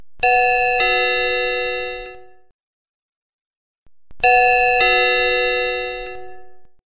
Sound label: cool msg tone ringtone, sms